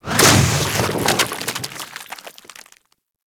Fungus Explo Large; Warframe; Venus Open World; Venus Landscape

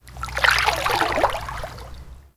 Water Lapping Gentle Medium; Ambience; Warframe

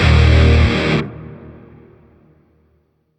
Shawzin Chromatic Chord G; Music; Warframe; Grineer; Chords

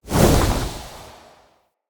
Casting Device Cast Right; Weapons; Player; Galene

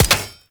Fishing Spear Damage Hit; Weapons; Venus Fishing Spears; Warframe

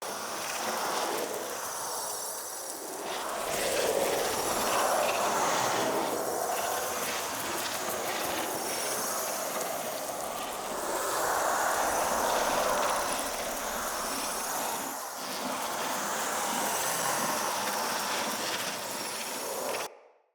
Wyld Invis Active Loop; Fey; Saboteur

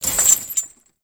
Rock Trap Chain; Ode Lantern Crate

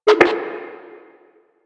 Static; Warframe; Lasrian; Lasrian Enemy B

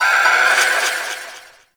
Corpus Ship Data Transfer Pulse, Ambience; Warframe; Corpus Ship Remaster

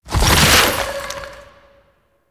protective shell charge; Citrine

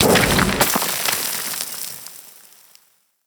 Micro Burrow Exit; Warframe; Corpus; Arachnoid Micro; Abilities

